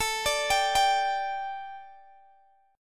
A 7th Suspended 4th Guitar Open G, chord

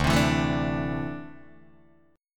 D 7th Suspended 2nd down Guitar Open D

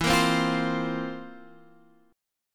F Major 7th Suspended 4th E, guitar chord

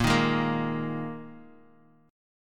Ab Suspended 2nd Bb

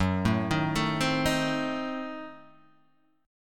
Gb Diminished 7th Guitar Open G

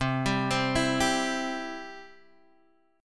C Major, Guitar Half Step Down

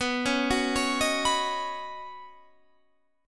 C sharp 9th Suspended 4th B, chord

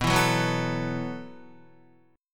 B 7th Suspended 4th B down Guitar; B7sus4; strummed; 4E,4A,5D,5G,5C,6F

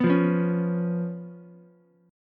B Suspended 2nd Flat 5th B down Ukulele; strummed; JGuitar

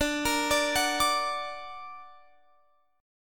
D Augmented D down Guitar Open F; D+ arpeggiated; JGuitar

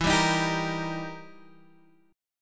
E Minor Major 7th Flat 5th, E, down

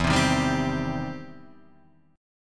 E Minor Major 7th Sharp 5th E down

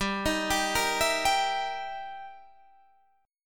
E Minor Major 7th Flat 5th, G

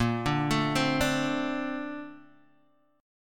A sharp Major 9th, Guitar, DADGAD

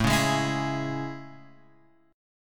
A Major 7th A; AM7 Chord {5 4 6 X 5 4} strummed

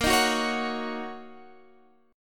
Fsharp 6th, guitar chord

